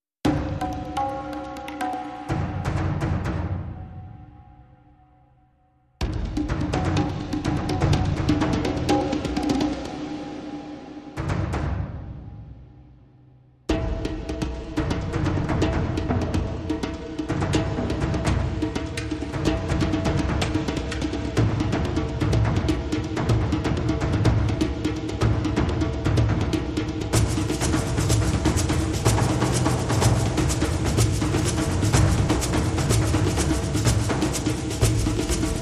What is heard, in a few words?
Only Drums